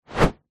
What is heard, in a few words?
whoosh fast; sound design